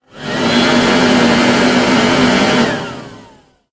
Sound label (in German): Laubblaeser